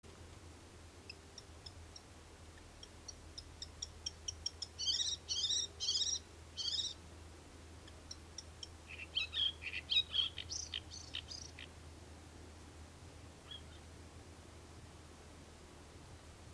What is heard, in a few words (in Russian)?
varakushka luscinia svecica volgensis; nochnyh ptits